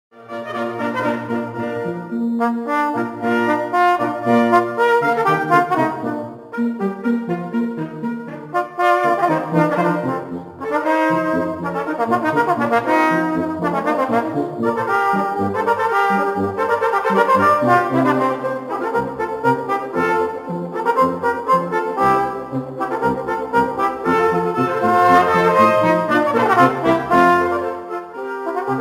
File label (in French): concerto trombone alto